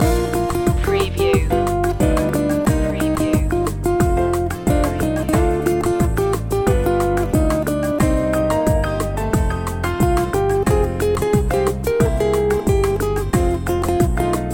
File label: bossa rossa loop